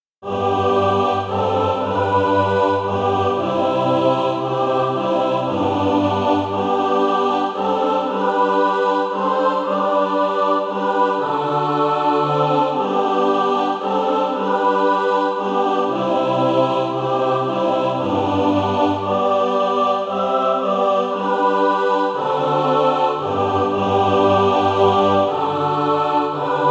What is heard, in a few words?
mixed; Breathe upon Us